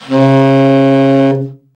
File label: TENOR SAX; Tenor Sax 3